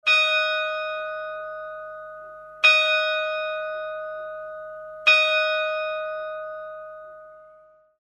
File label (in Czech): zvon; libor; zvonohra tony